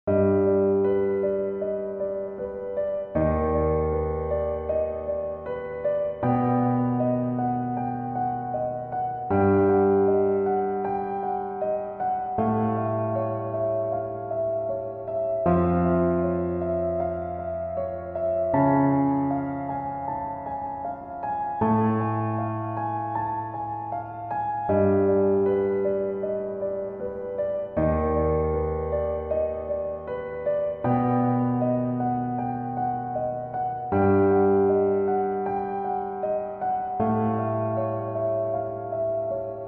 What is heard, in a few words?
Music - Loop; SOULS